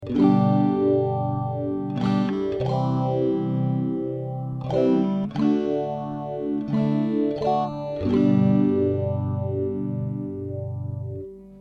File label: mxr phase 90 guitar; mxr phase 90 history